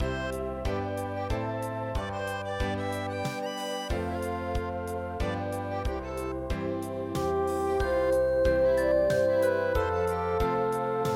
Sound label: merry xmas